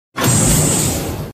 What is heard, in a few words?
stand appear